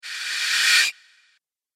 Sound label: Arcade Game Scary Vacuum, retro; Video Game Sound Effects; Retro Inspired Sound Effects